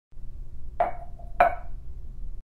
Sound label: Put glass on table; گذاشتن لیوان روی میز